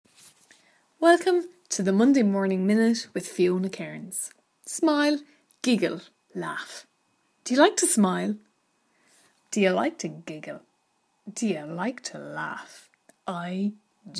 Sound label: smile giggle laugh; monday morning minute